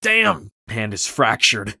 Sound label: broke hand; Escape from Tarkov